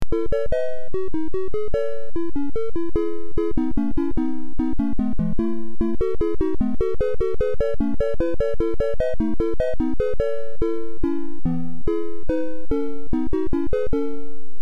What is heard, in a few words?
Watchwood Melody; Bards Tale 3